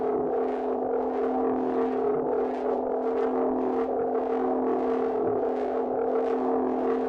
drone scan loop; Titanfall 2

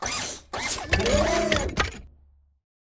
bt combat walk to idle; Titanfall 2

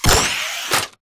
arc panel small activate; Titanfall 2

